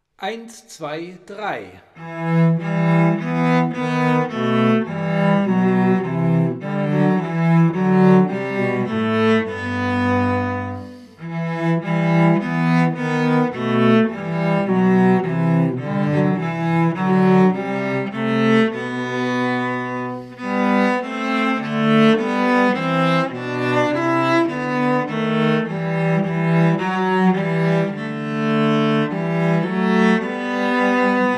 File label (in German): God Rest You Merry Gentlemen Melodie und Begleitung